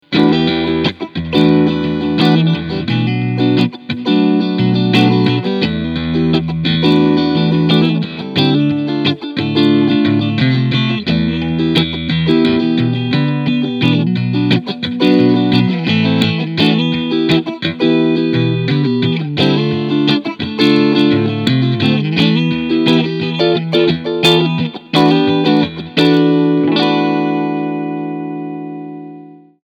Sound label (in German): Maybach Stradovari Cleansound Front und Mittel Pickup